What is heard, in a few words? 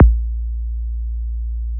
63HZ SY KICK, SYNTH KICKS; Best Service Alpha Dance vol.2